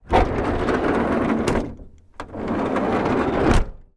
transport renault master camper van 1982 door slide open then shut